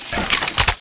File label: missile last, ballista; tfa cso